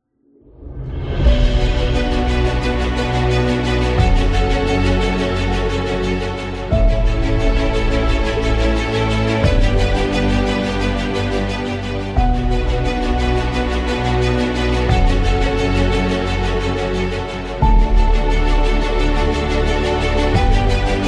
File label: Epic Background; epic action